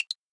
Woodblock

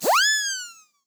Run

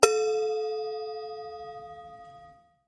bell

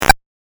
game effect

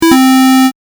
correct; シンプルなピンポンの低音ver; 正解の音・ピンポーン

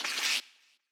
paper

